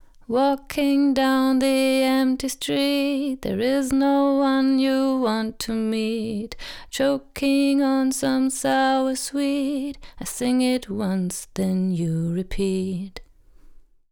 Tubesessor Vocal Fixed Kompression Classic clean; Heritage Audio

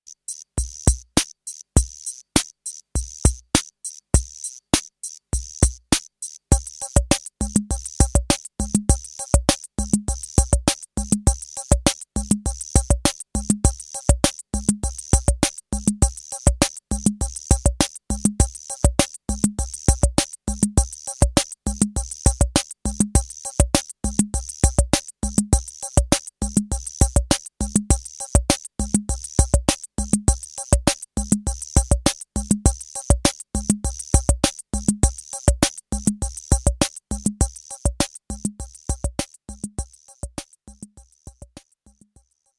Roland CR-78 Rock 2 und Mambo

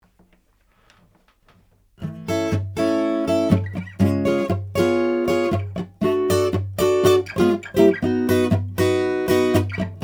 AEA R88 II Akustikgitarre

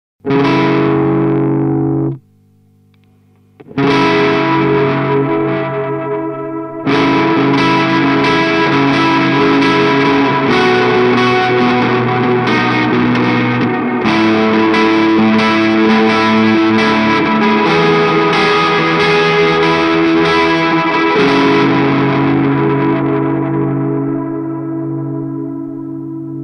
Fuzz nach dem Reverb

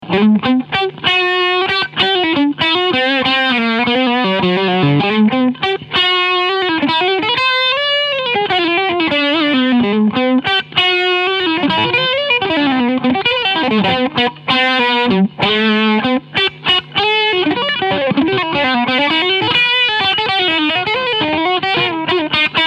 JHS Pedals 3 Series Phaser Feedback OFF mit Crunch

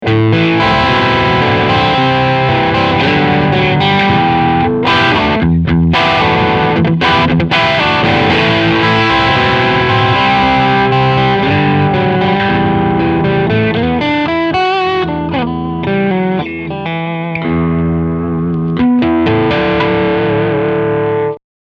Fuzz Akkorde

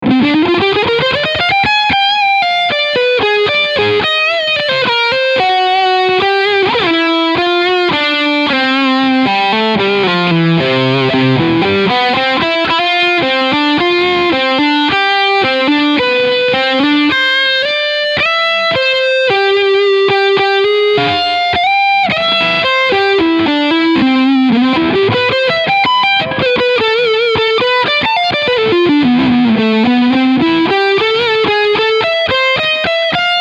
D'Angelico Deluxe Brighton Leadsound Steg Humbucker

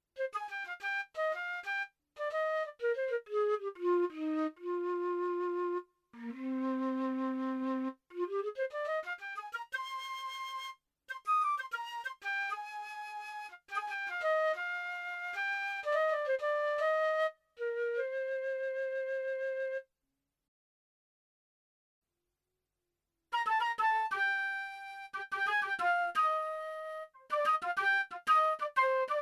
SD1000 Flöte Sax

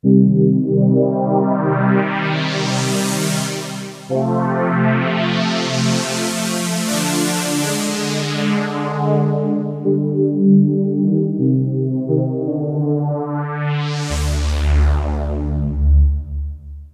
filter flaeche

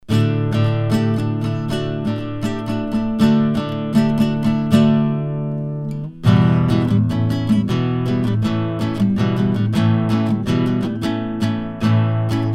Sontronics STC 20 Niere Gitarre

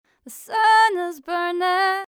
Melodyne Holpern noch da

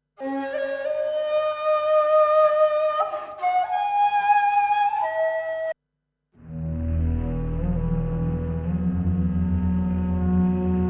Artefakte extreme Transposition Polysampling

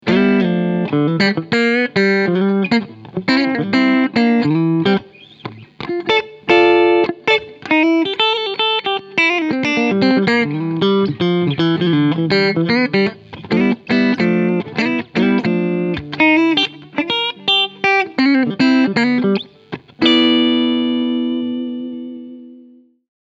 Clean beide Singlecoils

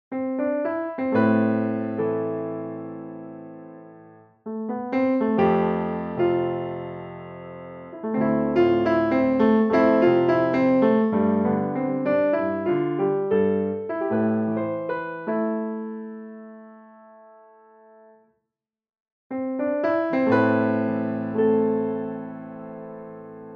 Melodie ohe und mit Dumper Zuhoerer

